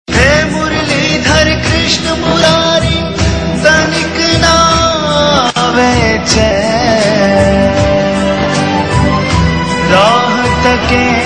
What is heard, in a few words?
Male; TV Serial Tone; Imagine TV; Meera